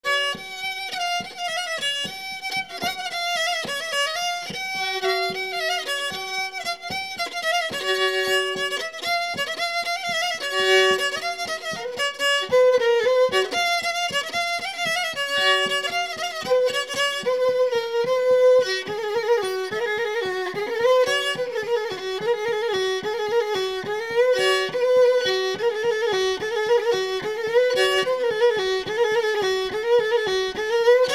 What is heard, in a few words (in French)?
Bourrée